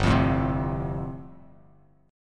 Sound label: G 7th Suspended 2nd Suspended 4th, Bass Standard; G7sus2sus4 strummed